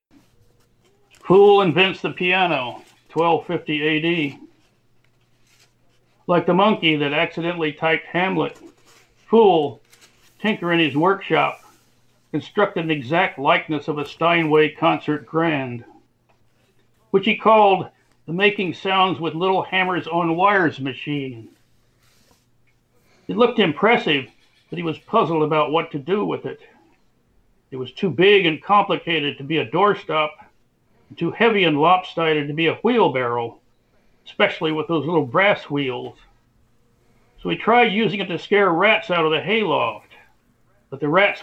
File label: Piano